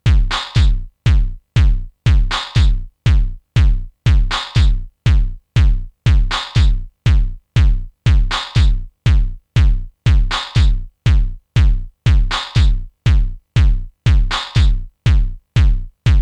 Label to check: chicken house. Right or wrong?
Wrong